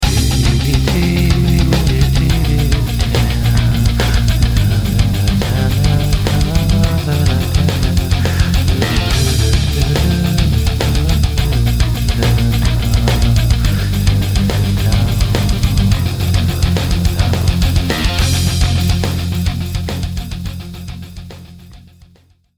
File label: Session Mondo Rock; UJAM